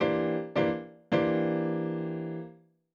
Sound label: Acoustic Piano